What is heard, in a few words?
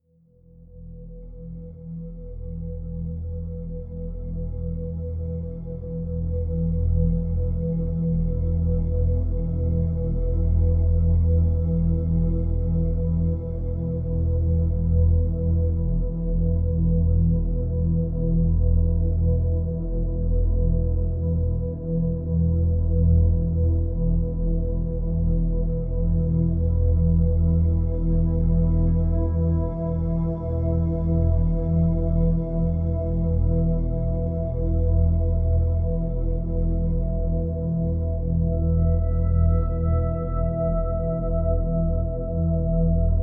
pads only